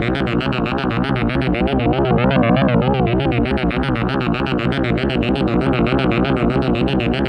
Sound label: SCANNER, FLYOBJECTS; AKAI Anime Sound FX